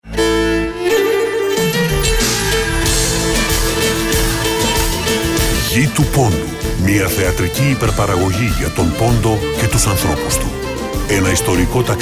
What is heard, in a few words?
Music trailer; ΓΗ ΤΟΥ ΠΟΝΤΟΥ